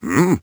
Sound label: hm; Crypt of the NecroDancer